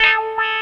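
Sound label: Guitar Wawa; Digital Sound Factory Xtreme Lead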